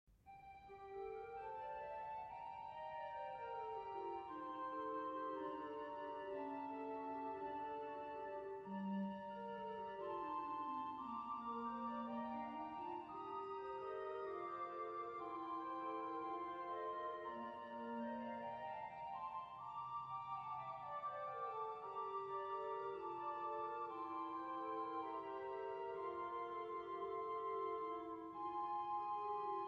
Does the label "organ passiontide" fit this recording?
Yes